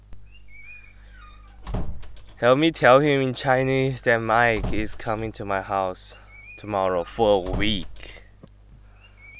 Shutting Door

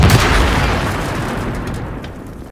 bang

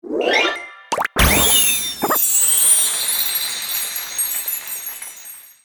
Full Combo; Donder; Arcade; Taiko no Tatsujin 2020 Version; Common Sound Effects

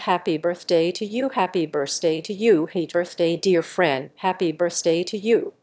sing; LLaMA omni; singing

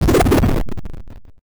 lavadrown; bigtowertinysquare

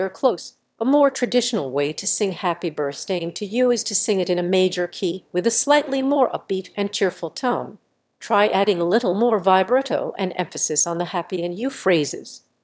sing

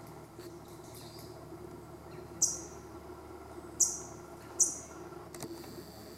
Tennessee Warbler, Leiothlypis peregrina; Aves

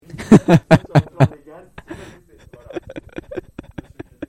laugh

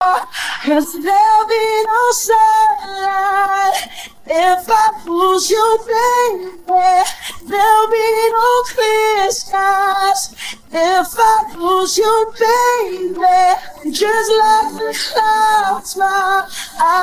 vocals

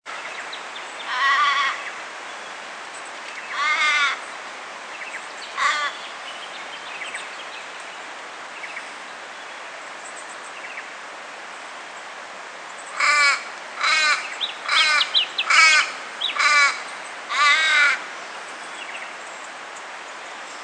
Brown-headed Cowbird, Molothrus ater; Aves

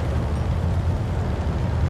engine

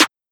snare

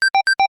QR Reading loop; Arcade; Taiko no Tatsujin 2020 Version; Common Sound Effects

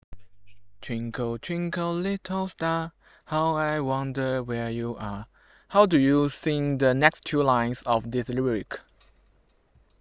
sing

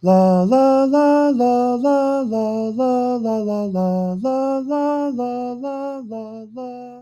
singing Gb minor; songstarter

